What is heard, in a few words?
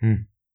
grunt